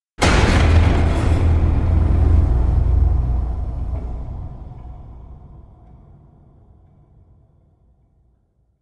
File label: evil slams devil